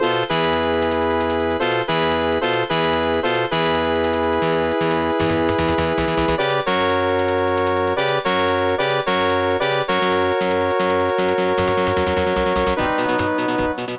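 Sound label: background music; Black Tiger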